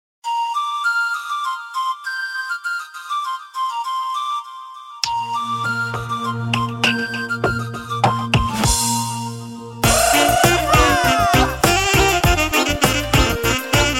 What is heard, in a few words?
romanian etno